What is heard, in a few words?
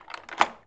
KEY DOOR